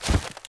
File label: snowfall, environment; t2-mapper